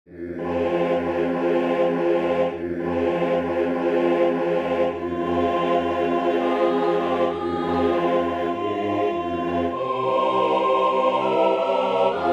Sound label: Wade In The Water tutti